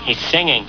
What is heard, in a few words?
SINGING; He's singing.